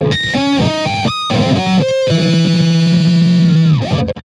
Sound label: Building Hover